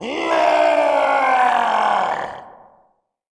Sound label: orc cheer